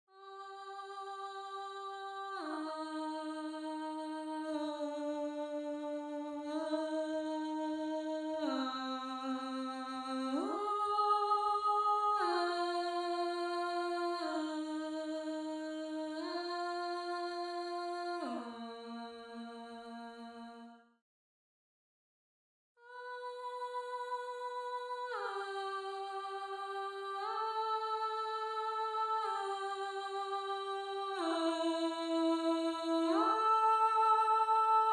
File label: Alto, counterpoint